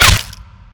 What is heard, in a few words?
impact, violence